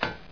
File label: ladder, player; mistake coop